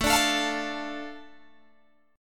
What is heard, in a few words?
A Suspended 4th Sharp 5th, Guitar Open G